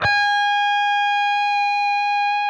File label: PRS A, Guitars, LEAD